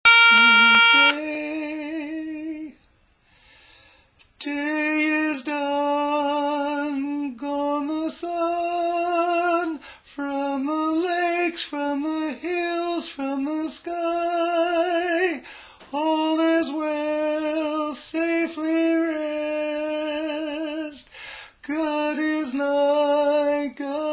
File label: Taps Tenor; for god & country music